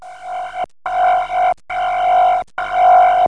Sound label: ship; Easy Phonics